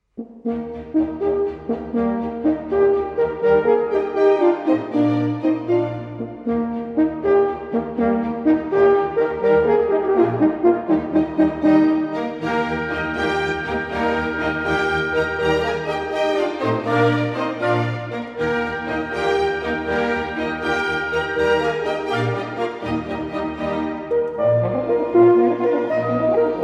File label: Concerto for Two Horns in Eb Major, Rondo, Allegretto